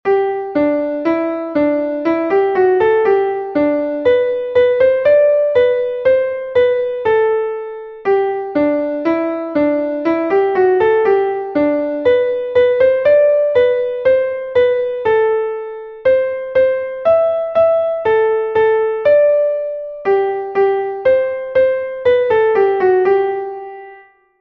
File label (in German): klavier; MelodieMeister